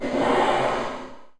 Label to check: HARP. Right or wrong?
Wrong